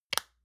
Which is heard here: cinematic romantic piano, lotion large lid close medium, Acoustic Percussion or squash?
lotion large lid close medium